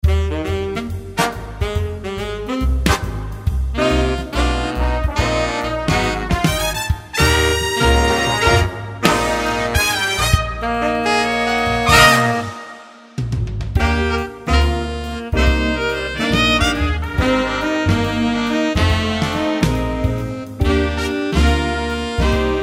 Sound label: Attension Deficit Disorder Jazz